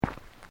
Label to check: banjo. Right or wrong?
Wrong